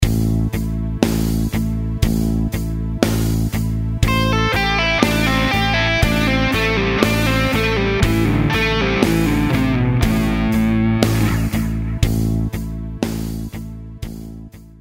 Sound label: Lick 60bpm